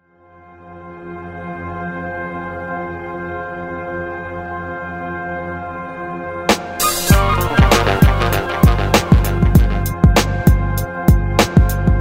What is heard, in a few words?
instrumental